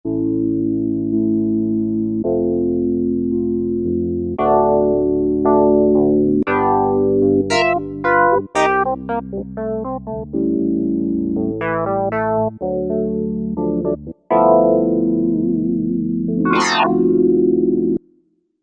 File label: E-Combo, Roland V-Synth; Factory Patches